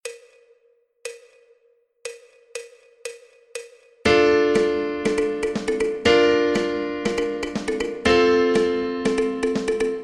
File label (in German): Intro schnell, klavier